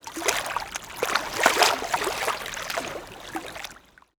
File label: isolated lake wave; Bluezone Corporation